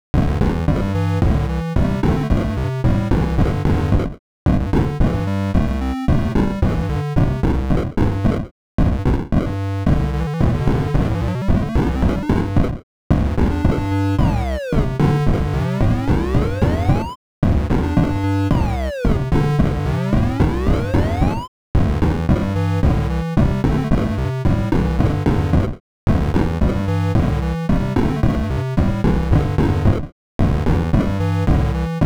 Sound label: song